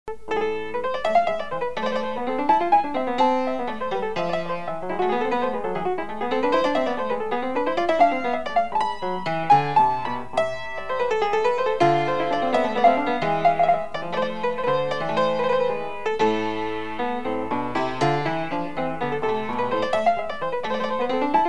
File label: courante